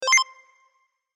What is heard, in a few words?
short msg